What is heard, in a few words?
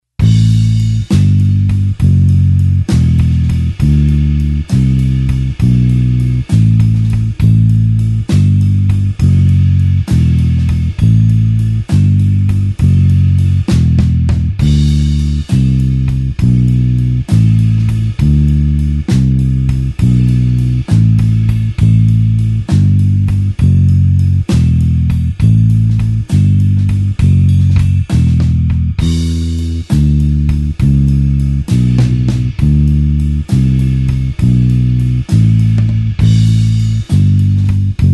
blues bassline slow A